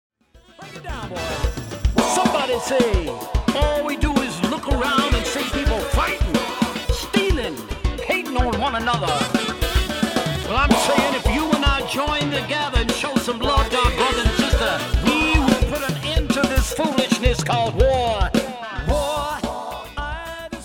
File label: Rap